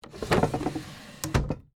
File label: Drawer Close Table, Antique, Wood, Small; Foley; Drawer Sound Effects; Blast Wave